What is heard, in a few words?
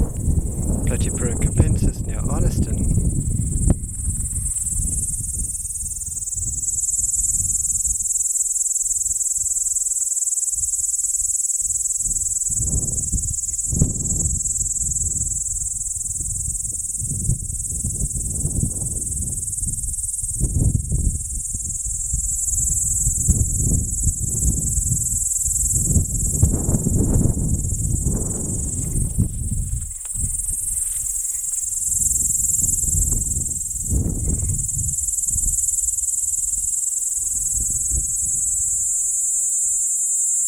P.capensis nr Arniston